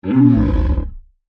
Monster Grunt, Horror, Monster, Grunt, Creature; Monsters; BlastWave

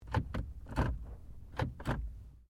CAR TOYOTA COROLLA GEAR SHIFT INTERIOR, hatchback; 2009 Toyota Corolla; Cars (Sound Effects:Vehicles)

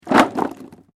WOOD PLANK MOVEMENT; Material components for constructing more complex sounds; Materials

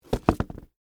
Boot Set Down; Foley; Dressing

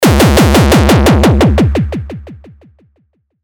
Hit Synth Stab Delay; Blastwave

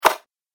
Golf Club Strike; Golf Sound Effects; sports

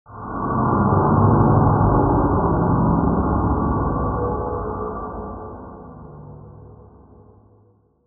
Swell Dark Reverb, Shimmer Swell Flange Slow; Blastwave; Swell Sound Effects

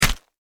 Impact Splat Crunch Flesh; Sound Effects:Objects

